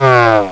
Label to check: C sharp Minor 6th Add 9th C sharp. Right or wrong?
Wrong